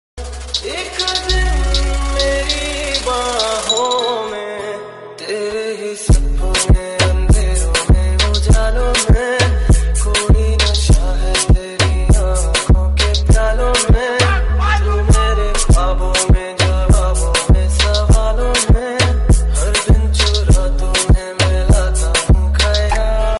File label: remix romantic haryanvi